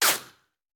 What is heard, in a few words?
Bullet Ricochet; Sound Ideas; Guns